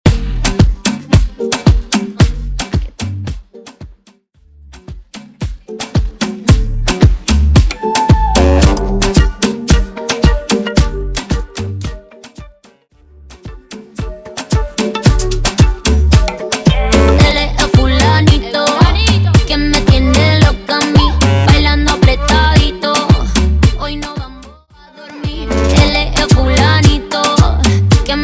Chorus In Intro Outro Dirty 112 BPM Mambo

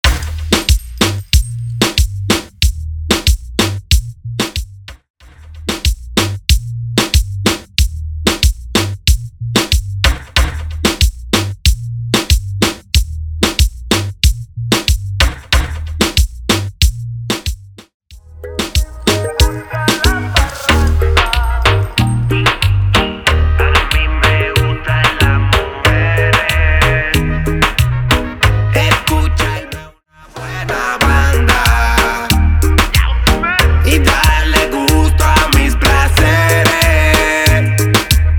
Reggaeton Clean 93 BPM

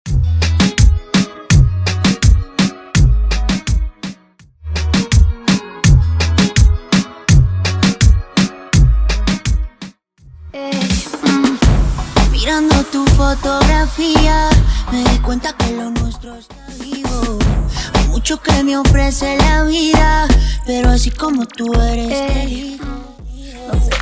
Reggaeton Intro Break Outro Clean 83 Bpm

